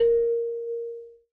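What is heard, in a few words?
elevator call; blizzard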